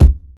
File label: old school deep kick c sharp key, kick drum